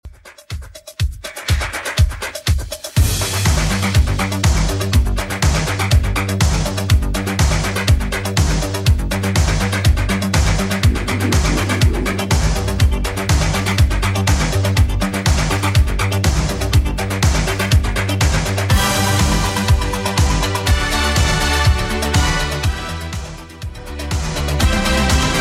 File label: 80s Dancemix Redrum 122bpm